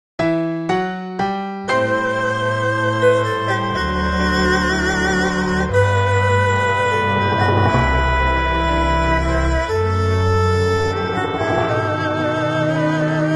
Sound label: classical version